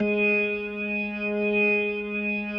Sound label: G#3S, B3, Vintage Keyboards